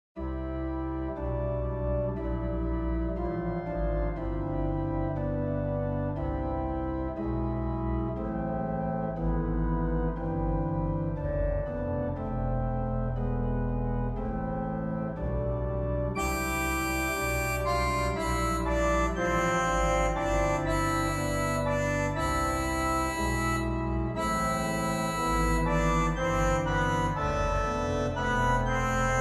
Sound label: OrganTeq Reeds